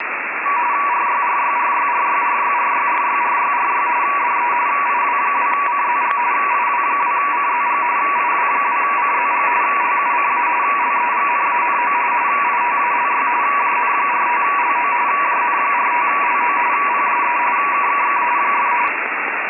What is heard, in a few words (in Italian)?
RTTY a 144.600 MHz; RTTY: Radio Teletype - Radio Telescrivente